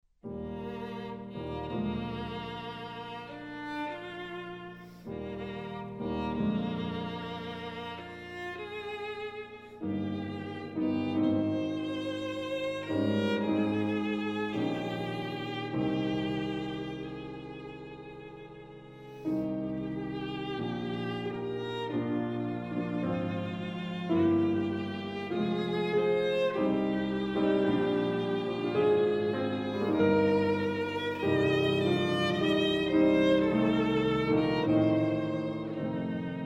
piano trio; kiel

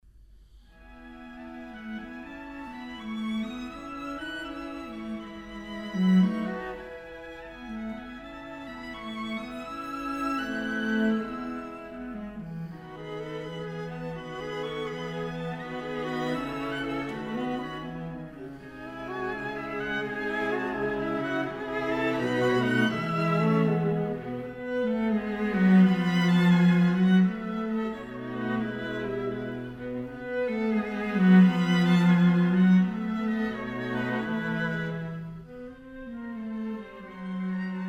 quintet; gade

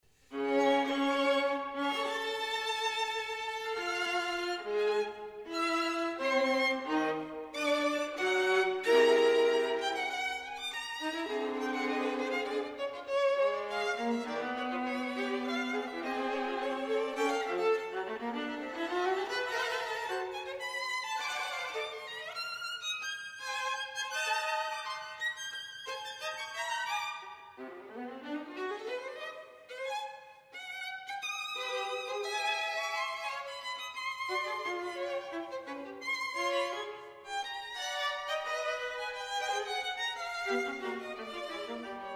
trio; bridge; rhapsody

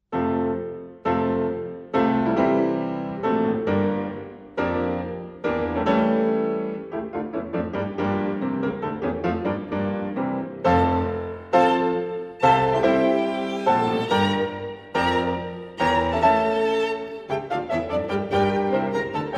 piano trio; bargiel; 1st movt

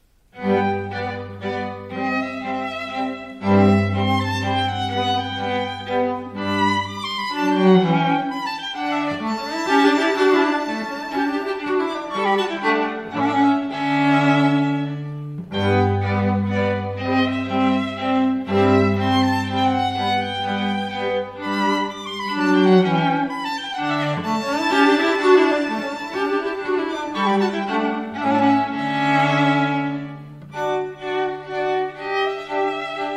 divertimento trio; vanhal

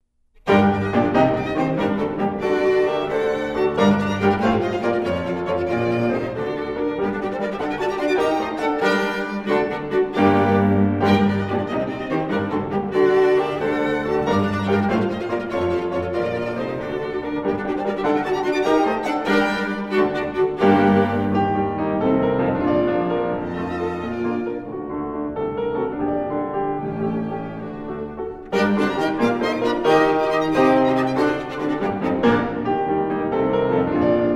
piano quartet; foote

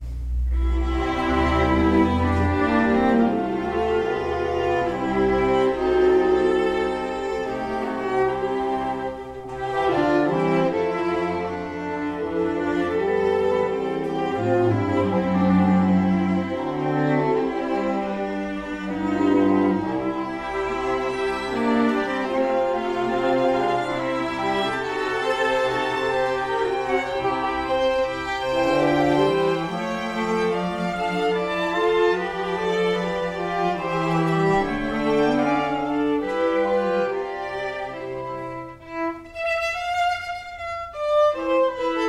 quintet; bengtsson

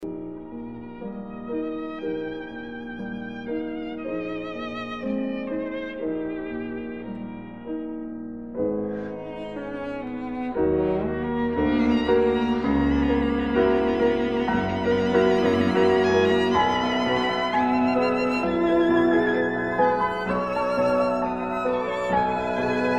trio; hurlstone

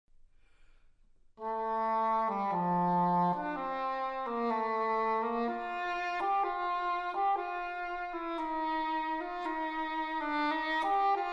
wind trio; karg elert

